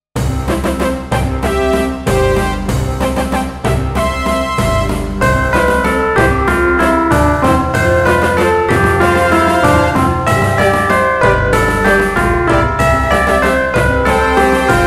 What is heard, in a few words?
fanfare